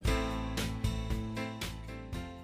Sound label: Instrumental Cha Cha